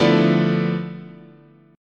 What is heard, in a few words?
D Minor 6th Add 9th, chord, guitar